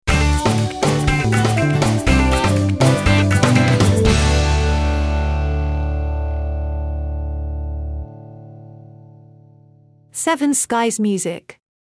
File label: Uplifting Stinger